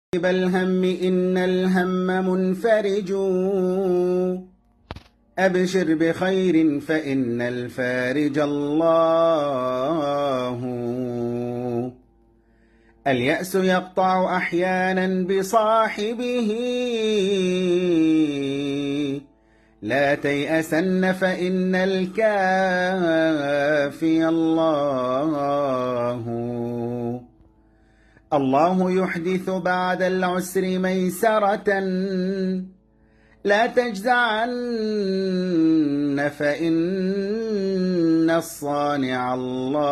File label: Islamic Prayer, Arabic; Gaza